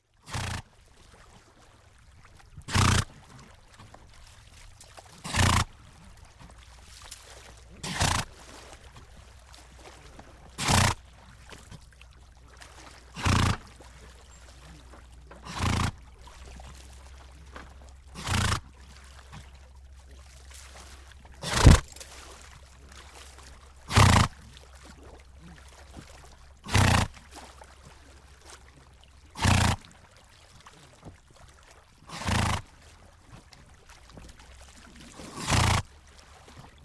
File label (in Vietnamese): tieng ngua tam song